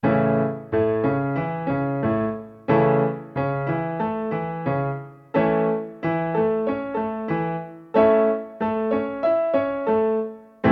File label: triad and inversion rhythm in A and Am